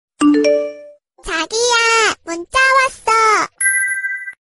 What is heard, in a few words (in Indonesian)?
Wa lucu korea Notification Ringtone